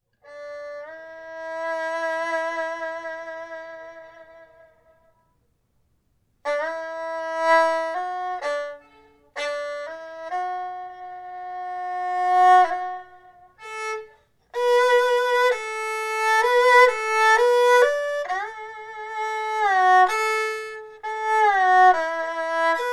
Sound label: Erhu